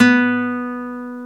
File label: NYLON B 2, GUITAR; AKAI S-Series CD-ROM Sound Library VOL-8